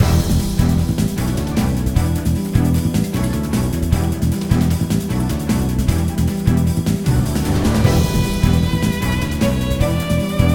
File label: BGM Battle Nature; aster tatariqus android ios mobile gamerip 2023; BGM Battle Nature Start